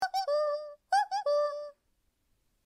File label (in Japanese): カッコウの鳴き声